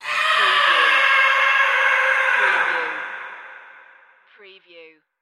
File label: Scream Processed; sound effects bible